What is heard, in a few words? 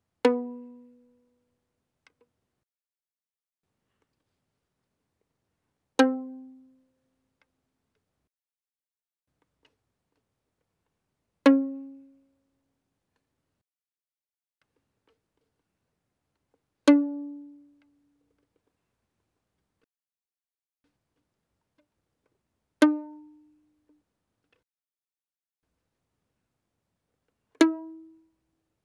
Violin pizz ff sul G B3 C5